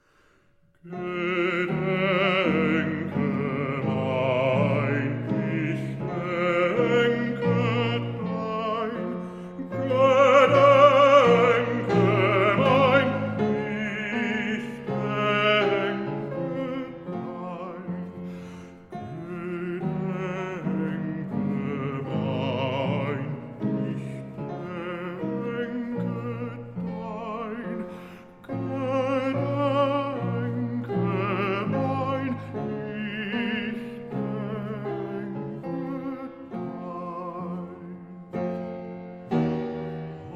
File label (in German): Lied fur ein singstimme mit Begleitung von Klavier; WoO 130 Gedenke mein; Textdichter unbekannt